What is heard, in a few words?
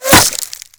impact wood; Modern Warfare